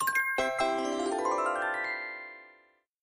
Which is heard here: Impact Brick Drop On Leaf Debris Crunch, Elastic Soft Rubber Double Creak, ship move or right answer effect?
right answer effect